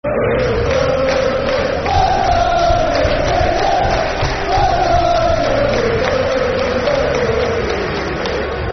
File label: We're Birmingham Football Chants (Soccer Song); Birmingham City Bcfc